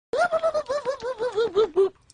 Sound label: Whoop; Zoidberg